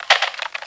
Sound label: hook pickup